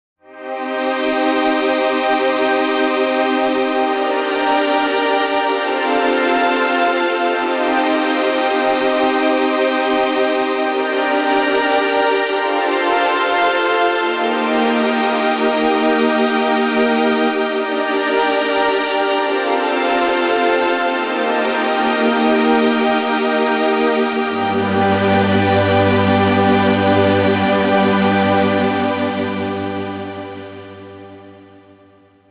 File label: Epic Strings, Korg